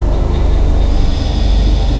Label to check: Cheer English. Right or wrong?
Wrong